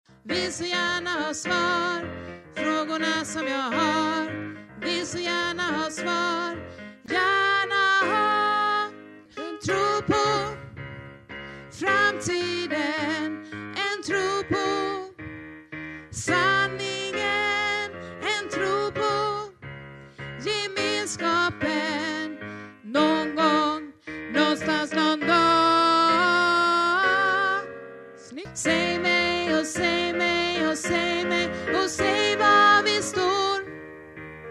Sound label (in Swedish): alt, stammor